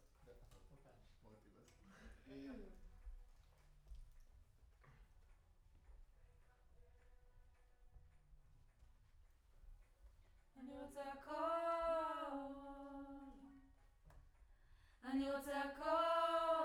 Choir Far

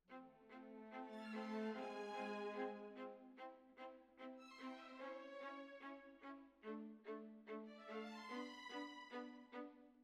Violas Over

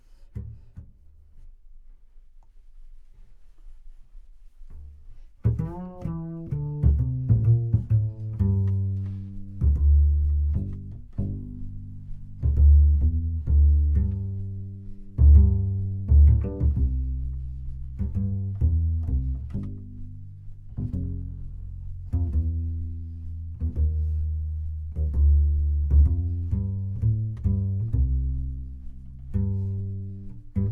Bass Bot

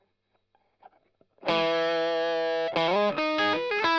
Strum Line

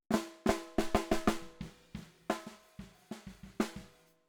SNR Bot

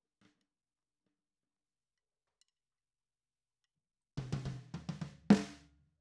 SNR TOP

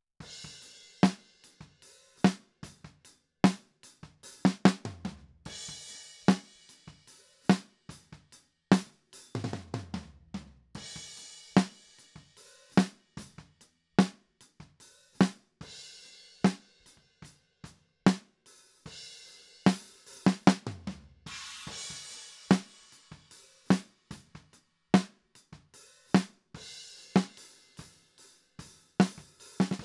Snare Top

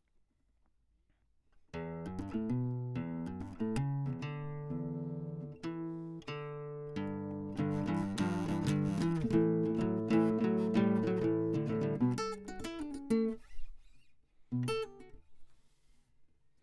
Classic Guitar

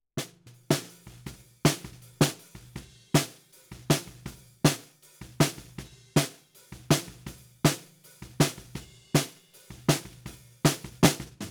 Snare Bottom, 160 BPM

